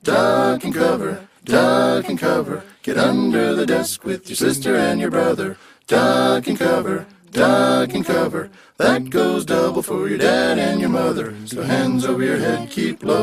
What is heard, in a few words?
duck jingle